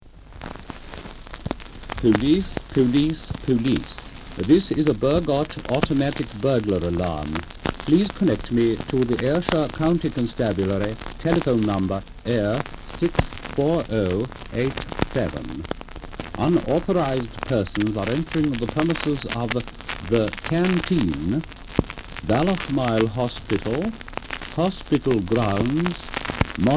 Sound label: hospital alarm record; ballochmyle